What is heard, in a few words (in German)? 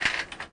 rollo end; volvo 9900; Vehicles; AI volvo 9900; Freyfurt Beta